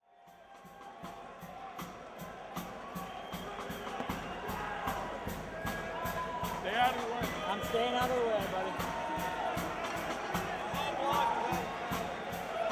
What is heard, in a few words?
Power Outage Drum Circle